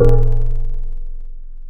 Mallet, Tables; Synthetic's Serum Collection; Chill Trap 2 Tables

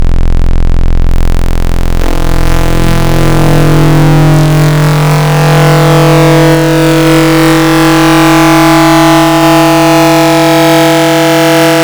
Saw Mod Bend, Serum Wavetables; Synthetic's Serum Collection; Cymatics - Nuclear for Xfer Serum Wavetables

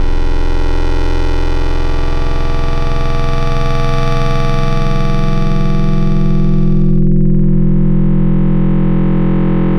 Digital Bell; Wavetables; Synthetic's Serum Collection; ESW Core Wavetables; Echo Sound Works Core Tables

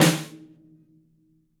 snare; Synthetic's Serum Collection; Attacks Misc

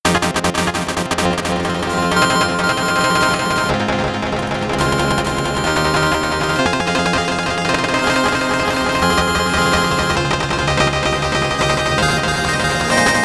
Magical Chimes

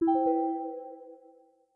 notify email; Windows Sounds; Windows 8

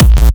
kick bass; Happy Tekno Track Contest Free SamplePack; Looneytunes; lolicore

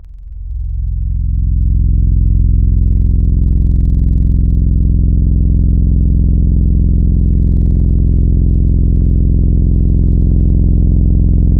Trombone; Synthetic's Serum Collection; Echo Sound Works Core Tables; ESW Real

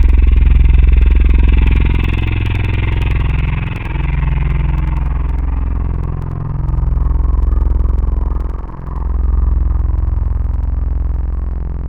Vocal - Swarm, wavetables; Synthetic's Serum Collection; ESW Core Wavetables; Echo Sound Works Core Tables

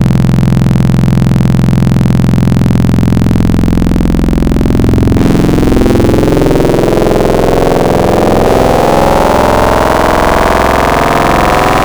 Soft Sq Sin Mod, Serum Wavetables; Synthetic's Serum Collection; Cymatics - Nuclear for Xfer Serum Wavetables

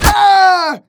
death, player; Team Fortress 2

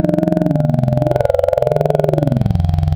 Vocal Ah Wo Ye Yo; Wavetables; Synthetic's Serum Collection; ESW Core Wavetables; Echo Sound Works Core Tables

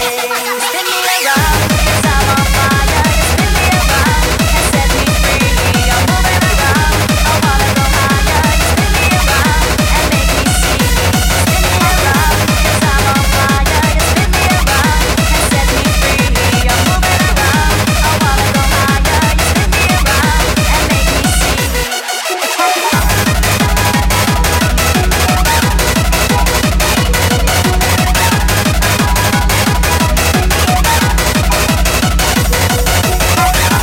Pitch Bass; lolicore; Comiket; Makinaforce Recordings

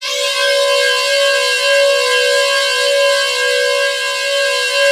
Detuned, Noise Osc Samples; Cymatics; Shapeshift

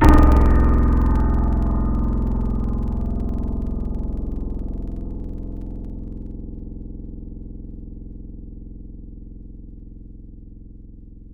Keys House Piano C1; Synthetic's Serum Collection; ESW X Keys

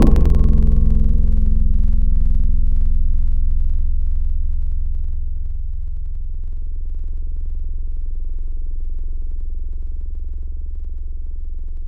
Hit, Tropical Mallets

